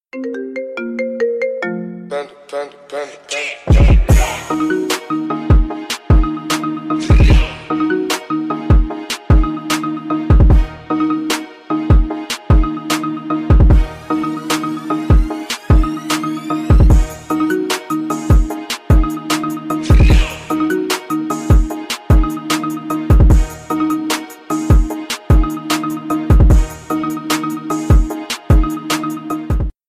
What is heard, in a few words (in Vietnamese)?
nhạc chuông iPhone 14 remix